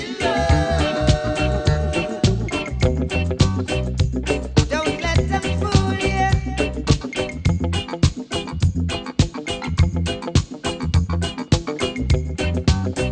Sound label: reggae